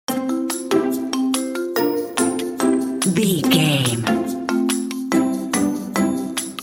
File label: hap hap clappy loop